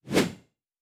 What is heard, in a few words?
Whoosh; Fantasy Sounds Bundle